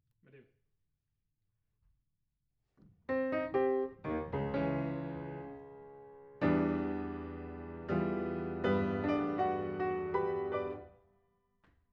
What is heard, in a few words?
Piano A